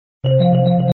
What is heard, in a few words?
msg msg msg ringtone